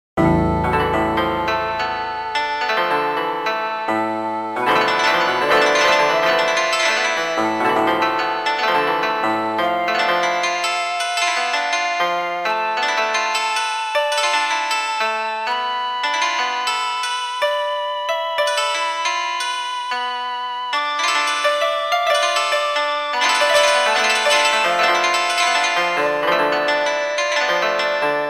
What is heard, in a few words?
Playing the shakuhachi on a rocky shore